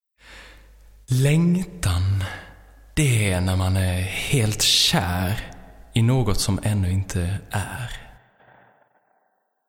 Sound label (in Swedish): musik